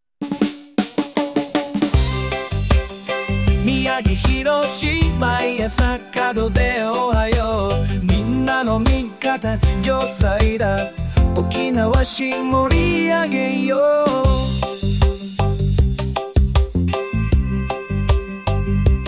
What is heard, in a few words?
theme reggae